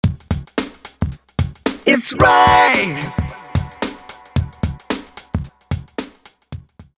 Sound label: Back Vocals Stretch